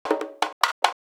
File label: Bongos; PsyTrance; ABLETON; PsyTrance Project; DMS PCAB Bongos